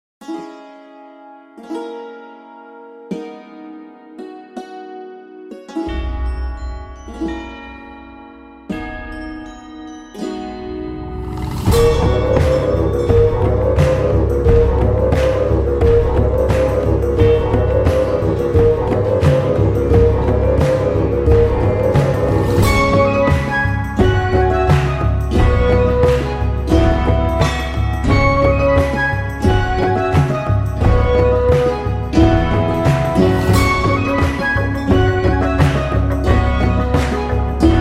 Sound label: Accordion Rap Medieval Style; Accordion All Caps Rap